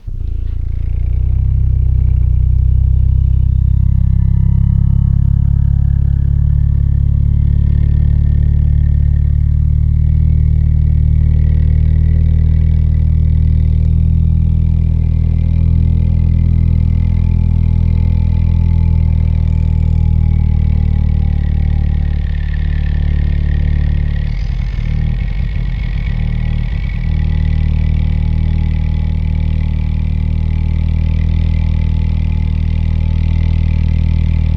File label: Low Sounding Engine